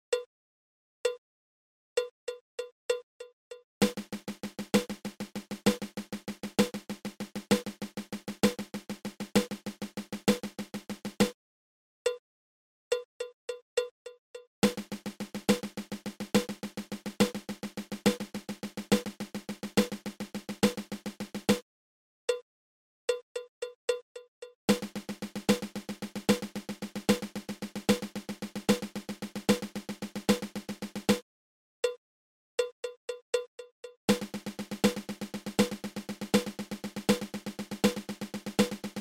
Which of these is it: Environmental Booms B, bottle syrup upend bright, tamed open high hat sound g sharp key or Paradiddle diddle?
Paradiddle diddle